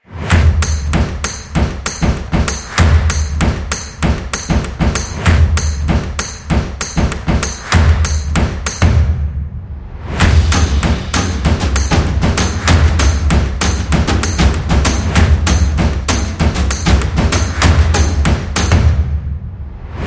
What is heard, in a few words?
Percussion Fun; stomps action